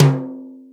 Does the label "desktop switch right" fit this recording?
No